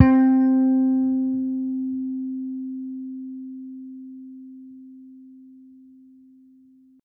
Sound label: String Bass Electric c4 hard; TrashLibrary